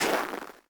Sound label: Personnel Footsteps Snow; DCS